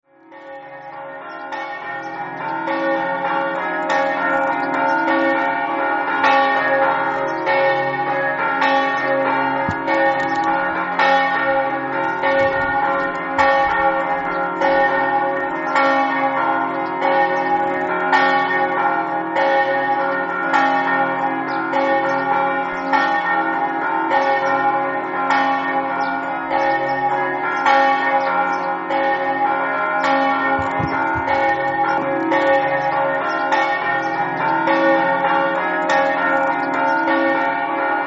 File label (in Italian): campane, Mossa